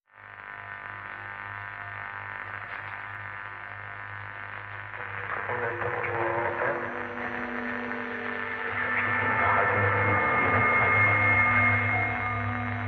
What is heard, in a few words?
Radio SFX Only Mix